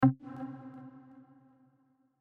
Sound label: menu options click; #-K- Elaina [Less Animation]